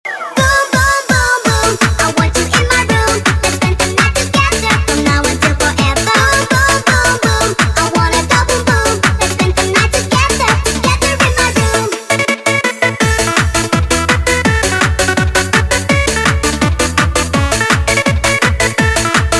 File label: Boom boombom